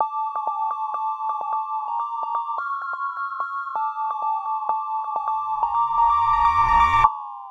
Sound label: lead; whisper